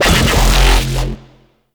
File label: Infested Shotgun Growl; Weapons